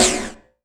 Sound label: E2, synth line; 90 s Sample CDs; NiceBeats; SynthLine VOL-3